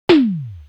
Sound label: Drum; Coldcut's Kleptomania VOL1